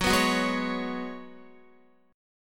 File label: Gb Suspended 2nd Suspended 4th, Guitar; strummed